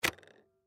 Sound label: Toy Cd Player Open; Miscellaneous Cartoon Sound Effects; Foley